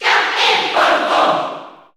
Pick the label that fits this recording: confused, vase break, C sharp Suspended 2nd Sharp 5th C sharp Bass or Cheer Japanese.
Cheer Japanese